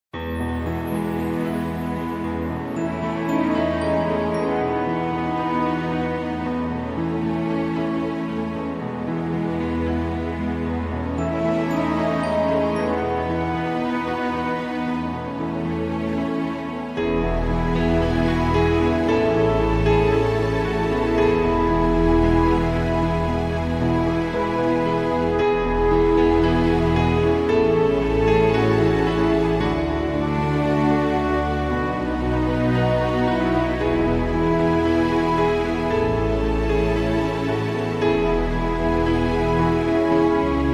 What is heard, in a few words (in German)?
Märchenhafte Entspannungsmusik